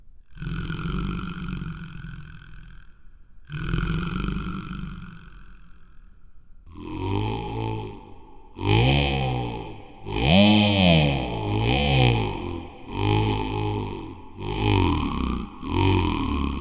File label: monster truck